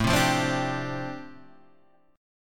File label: F 6th Add 9th A, chord